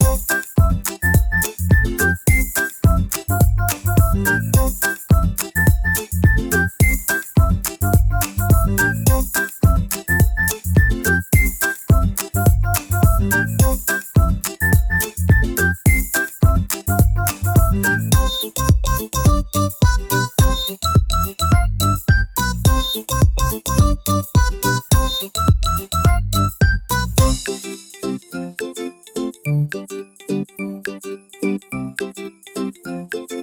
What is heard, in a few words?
dopamine ukulele